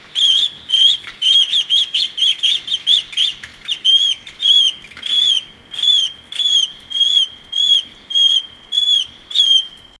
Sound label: duck quack